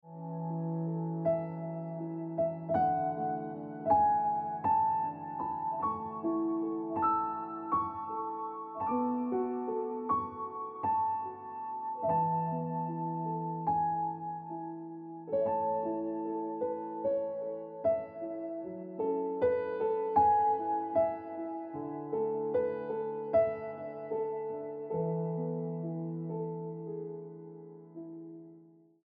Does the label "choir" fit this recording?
No